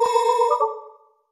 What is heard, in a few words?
Low Battery; android